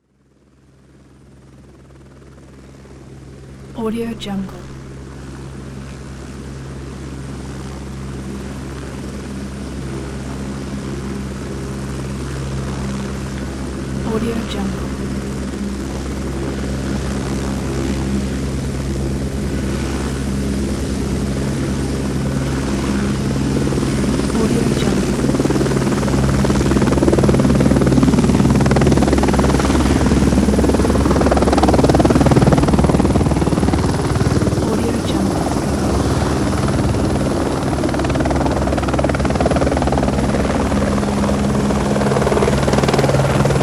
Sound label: helicopter